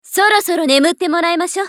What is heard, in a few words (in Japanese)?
ファイター女 RIP